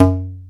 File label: Tube Hi Open; EMU Planet-Earth